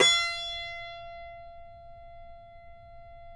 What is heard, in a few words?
CUMBUS F, Guitars; Spectrasonics; Hans Zimmer Guitars VOL-2